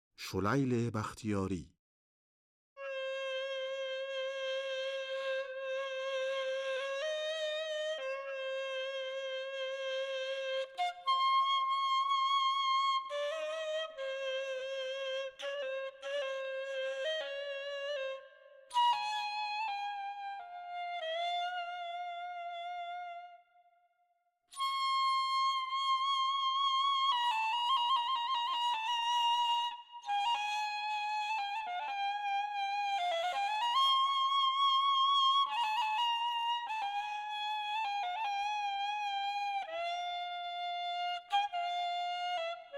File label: Shokayl-e bakhtiari Isfahan Radif-e Ney; Avaz-e Isfahan